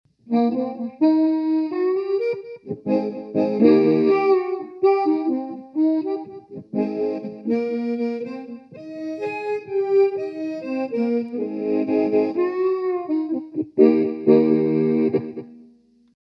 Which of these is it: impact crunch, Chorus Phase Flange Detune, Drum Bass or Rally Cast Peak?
Chorus Phase Flange Detune